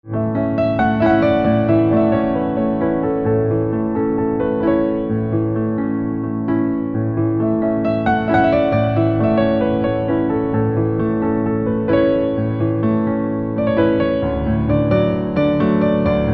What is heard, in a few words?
Piano Silent